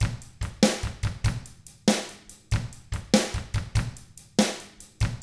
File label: ROCK 6/8, Drums; ILIO; Double Platinum Drums 1